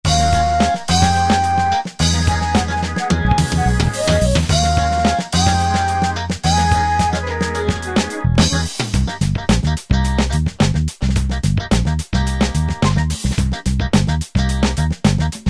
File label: Acid Jazz, Yamaha QY70; Preset Styles